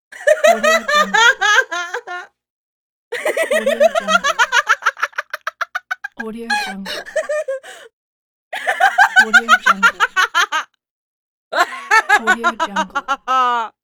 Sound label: laugh female